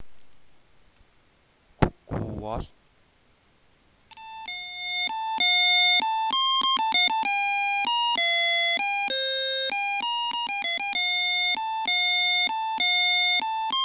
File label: CUCKOO WALTZ